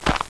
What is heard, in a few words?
dirt